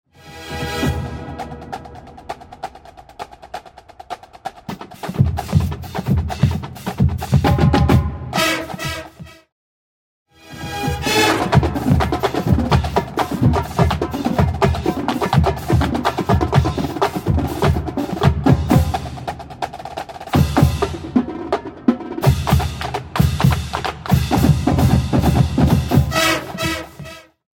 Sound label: Drum Feature, marching band